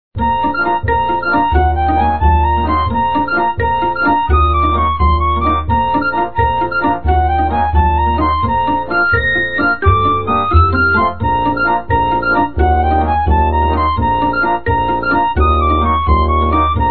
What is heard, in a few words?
Happy Dance